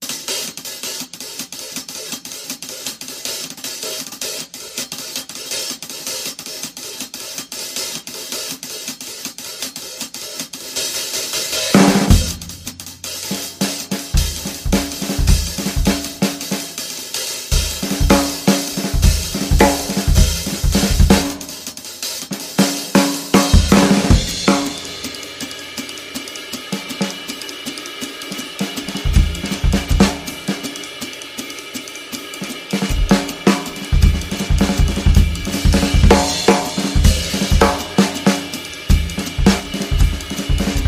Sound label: Quick Jazz